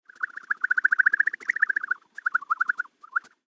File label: Firefly Fantasy Flying Around; Aliens; Big Room Sound